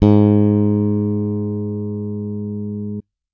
bass electronic, electric bass finger

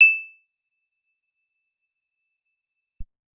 guitar acoustic, acoustic guitar nylon

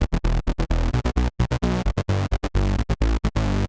bass, edm samples

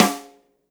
snare, rock drum kit

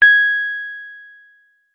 guitar electronic, clean electric guitar

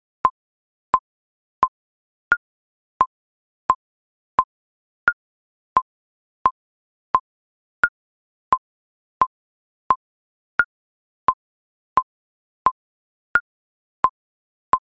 click

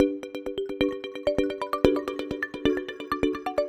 gd bottles

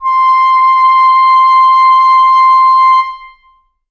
reed acoustic, clarinet

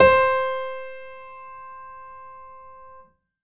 keyboard acoustic, acoustic grand piano

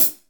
hi-hat closed, rock drum kit

